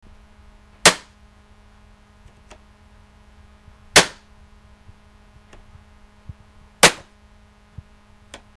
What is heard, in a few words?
starter